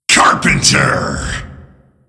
carpenter; nzp

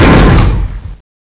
fire, weapons; ParoxysmII

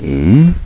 MOO; greed

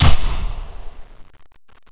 explode, grenade; weapons; nzp

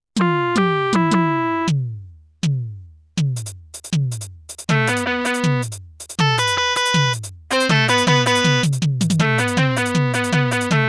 bgm; q3rally

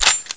bolt release, weapons; ppsh; nzp

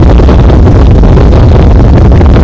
flamer hum, weapons

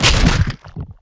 hive wound

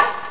wood hit; ParoxysmII; ambience

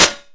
spark; gzdoom

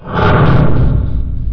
pump; q3rally

